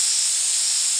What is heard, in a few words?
SPRAY